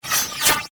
Space Hydraulic, Scifi, Space, Hydraulic, Fast, Science, Fiction